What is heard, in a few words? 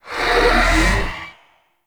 Rifle Void Charge; Weapons; Warframe; Zariman